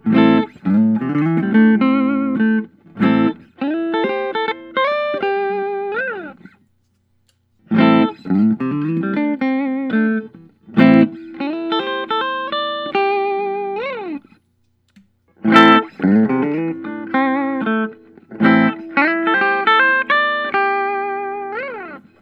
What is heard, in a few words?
Paul Reed Smith DC 245; ted mccarty